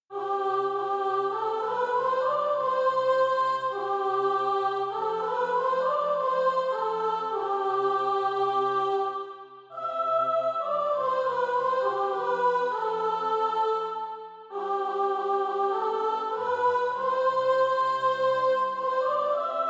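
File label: soprano, Practice Music; A Glorious Church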